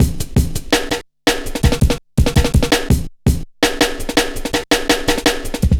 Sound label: Cool, Drum Loops, 165.5, Jungle; Zero-G Jungle Warfare 1, 2 & 3